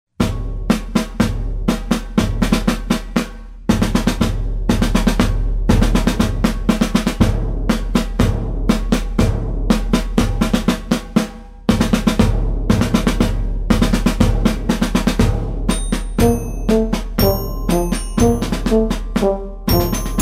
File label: band infinity march 120; vusd